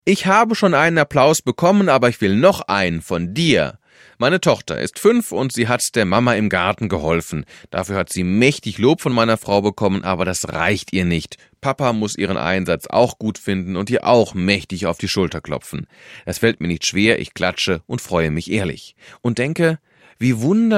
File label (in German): applaus